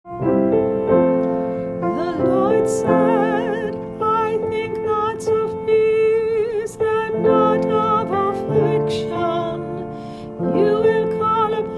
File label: Antiphon; 33rd Sunday Ord